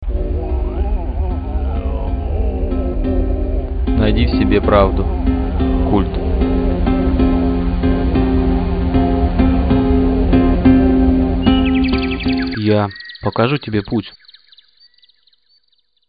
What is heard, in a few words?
jingle